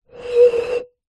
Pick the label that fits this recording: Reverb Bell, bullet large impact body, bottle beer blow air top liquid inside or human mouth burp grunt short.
bottle beer blow air top liquid inside